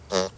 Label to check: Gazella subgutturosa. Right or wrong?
Right